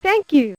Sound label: Coin; Hatris; arcade